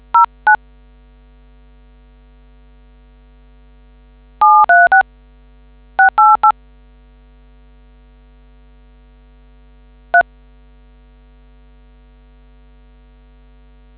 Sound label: dtmf